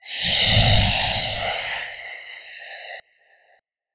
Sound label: Monster Reapers Death; shieldwall chronicles swords of the north